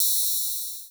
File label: radioactive output, virtual membranes